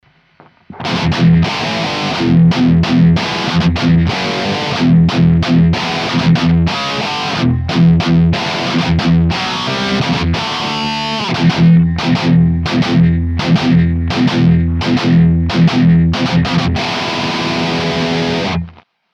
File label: backing; Triaxis